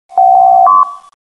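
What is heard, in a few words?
slider open, Geräusch für Slider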